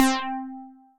Funky Pluck, Synth Lead; Instruments; Ableton; Synth Essentials; Operator

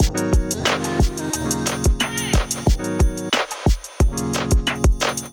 kit groove 90 bpm; MIDI Clips; Keys 2 Love Kit groove; Ableton; Factory Packs; Beat Tools

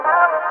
Vocals; Launchpad Mini MK2; Lightshow Pack Project

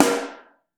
SN3 Mono, Snare; Ableton Foundation FreePacks; Snare Designer

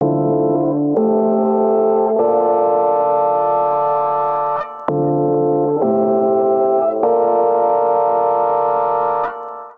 Clav D Minor 86 bpm; MIDI Clips; Accompaniment; Rise; Ableton; Factory Packs; Chop and Swing

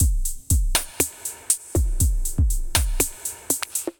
64 Pads Dub Techno Kit, Drums Hybrid; Ableton; Factory Packs; Drum Essentials

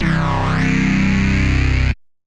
Bear Paint Bass; Ableton; Factory Packs; Chop and Swing

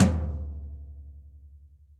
Tom Floor Classic Muffle, Drum Hits; Standard Hits; Ableton; Factory Packs; Session Drums Studio

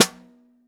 SN Bottom, Snare; Ableton Foundation FreePacks; Snare Designer

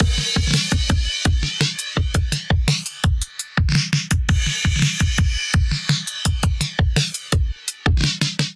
Western Australia Kit 112 bpm, Drums, Basic; MIDI Clips; Drive and Glow; Ableton; Factory Packs

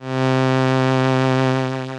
Strings, Retro Synths; Ableton; Factory Packs; Opus

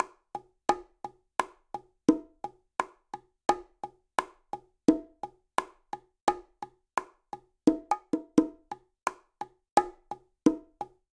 Bolero Bongo 86bpm; Latin Percussion; Ableton; Factory Packs

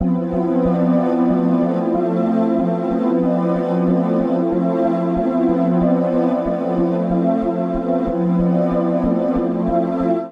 Serenity C Major 93 bpm; Accompaniment; Tonal; MIDI Clips; Drive and Glow; Ableton; Factory Packs

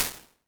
Background; Ambience and Small Rooms; Ableton; Factory Packs; Convolution Reverb

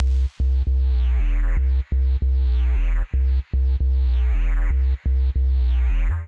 Sine Wobble E Minor 153 bpm; MIDI Clips; Tonal; Percussion & FX; Skitter and Step; Ableton Factory Packs

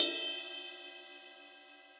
Ride Multirod Bell Dry Acoustic; Drums; Drum Hits; Standard Hits; Ableton; Session Drums Studio

